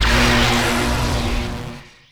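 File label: Laser Fire Interior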